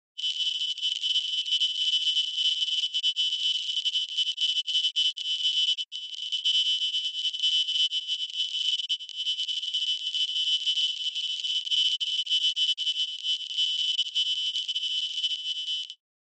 Noise HF Elect Drone

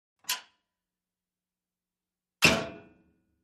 Wash Mach Lid Open Clo; washing machine

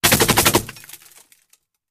Bullet Impacts Metal

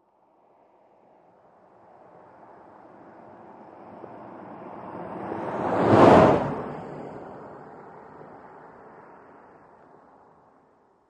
Sm Car By L To R At 55 mp, compact

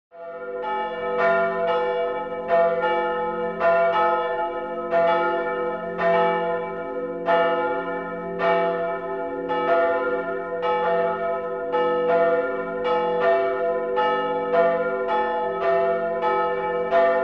Two Church Bells Toll; bell towers

